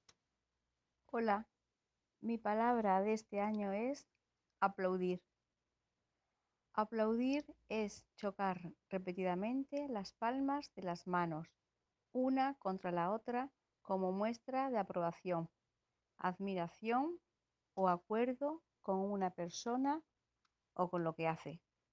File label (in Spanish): Aplaudir; Horten